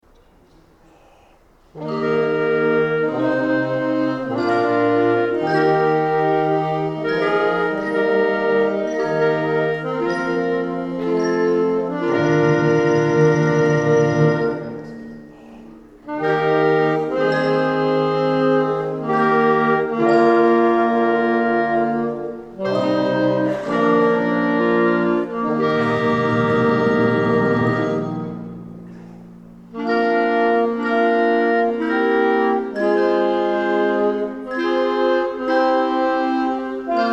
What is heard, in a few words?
bach chorale